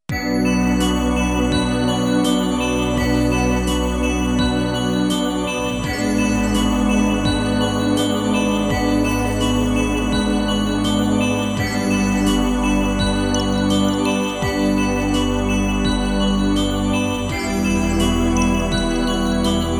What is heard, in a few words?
Pads